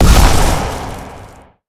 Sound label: explode, weapons; tf2; alcoholics4thlaw